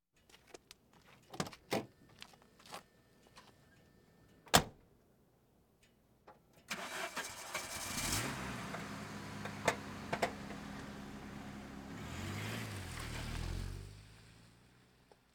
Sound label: Automobiles various